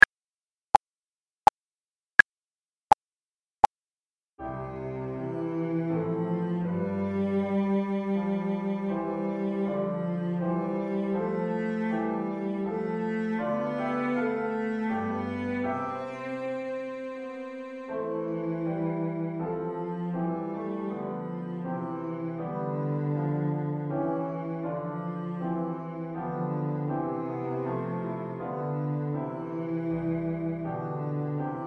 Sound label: Chorale